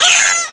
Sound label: Peahat Hit